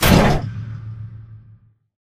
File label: pod door ext verb open start; Titanfall 2